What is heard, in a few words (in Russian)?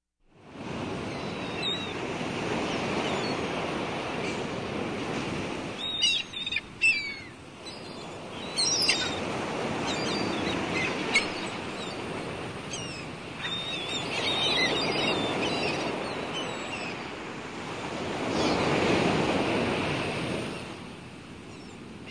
More chayki